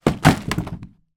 impacts food items fall kitchen shelf; zapsplat

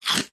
human eat crunch bite single dry cereal piece

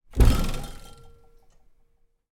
cupboard door open wine glasses inside rattle

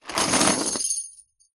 industrial chain med pick up from wood panel; zapsplat

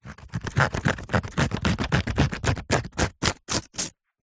foley paper sheet cut with knife; zapsplat

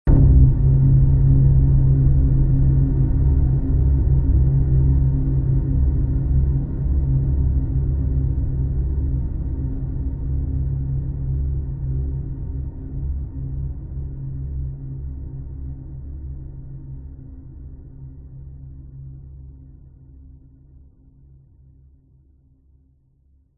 horror hit thud subtle dark eerie

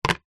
foley plastic container set down on concrete; zapsplat

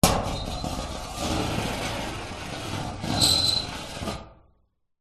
metal scrape resonate garage door; foley

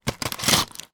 medical medicine foil blister pack into box

